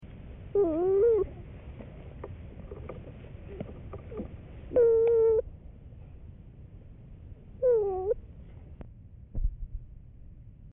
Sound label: coos, bear sounds